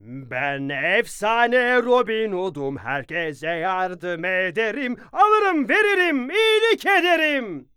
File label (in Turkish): Şarkı